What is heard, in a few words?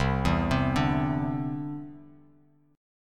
C-Major Add 9th; arpeggiated